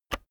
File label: keyboard external enter push heavy